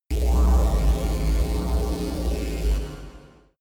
space working machines

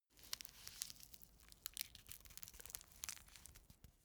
cigarette roll in

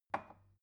glass put down cupboard soft

